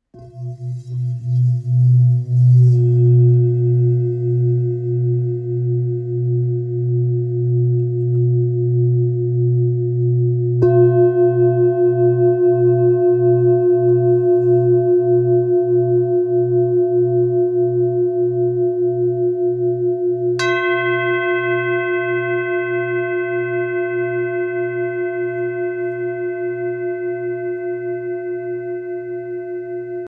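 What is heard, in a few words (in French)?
Bol therapeute si, bol chantant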